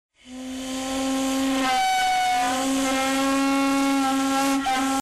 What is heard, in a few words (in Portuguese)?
Apito Maria Fumaca